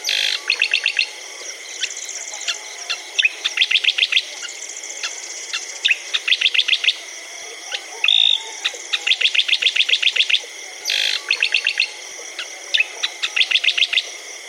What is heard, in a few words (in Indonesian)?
suara cicakoreng jawa